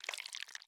蜂蜜块滑动声

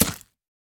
南瓜雕刻声

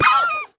狐狸死亡声

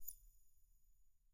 眼眸花开放声

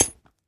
锁链踩踏声

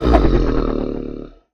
劫掠兽眩晕声

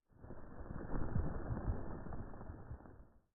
下界荒地地面声; ambient

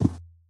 骆驼脚步声

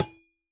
铜破坏声

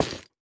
下界木悬挂告示牌破坏声

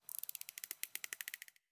海豚水中待机声

